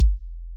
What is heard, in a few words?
Kick; 808